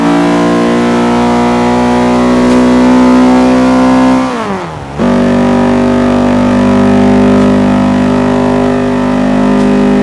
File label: fourth cruise, vehicles